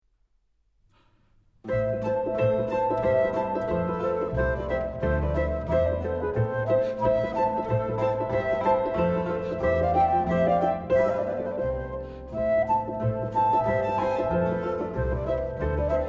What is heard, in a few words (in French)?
trio en si min allegro; TWV 42h2